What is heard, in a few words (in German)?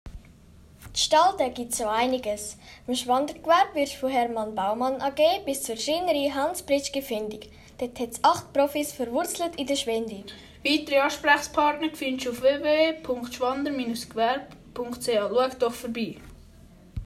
Jingle; Hermann Baumann AG Schreinerei Hans Britschgi